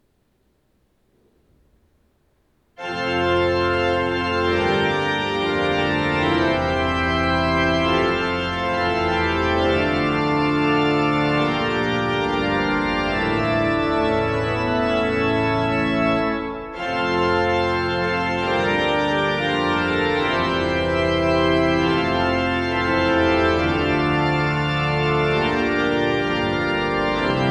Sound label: Fanfare, Narvik kirke